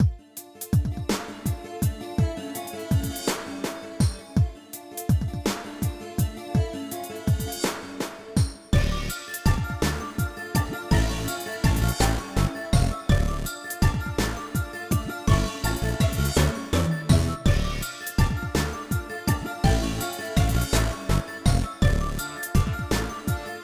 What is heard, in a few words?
Loop; Meenah; openbound